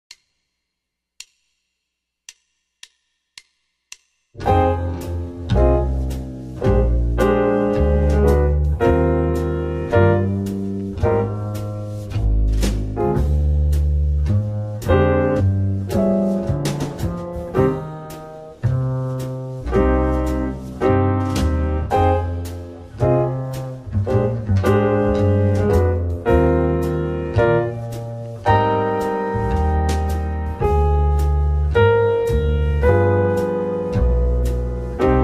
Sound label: Chords Backing Track in C minor